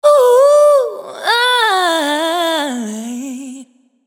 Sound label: vocal riff hoo runner Bm